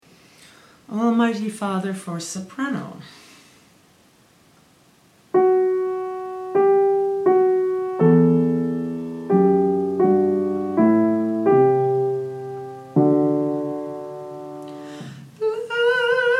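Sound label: Almighty Father soprano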